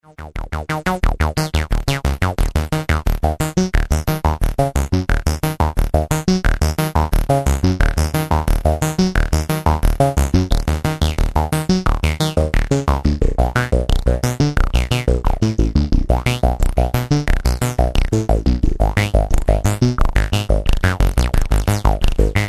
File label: filter